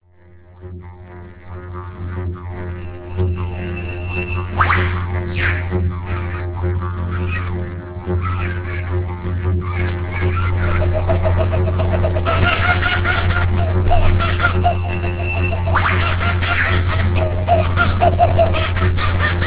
didgy

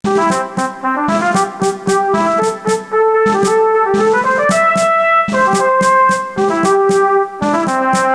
dixie

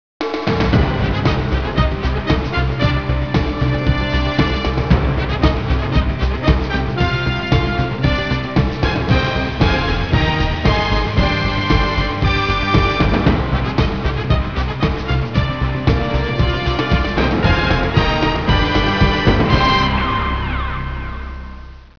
fox song

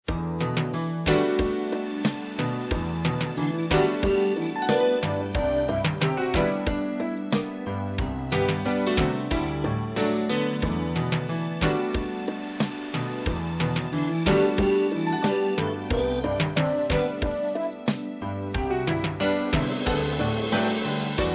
new age

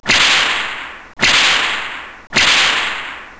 Whip

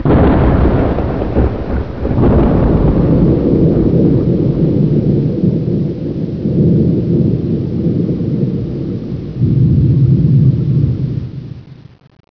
thunder

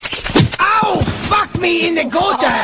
goat

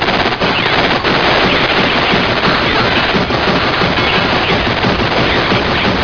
shot

